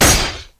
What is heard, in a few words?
block metal, Weapons